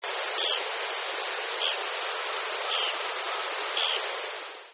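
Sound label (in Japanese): kogera, Japanese pygmy woodpecker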